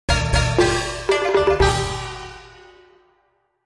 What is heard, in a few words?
Victory; Kong Fu World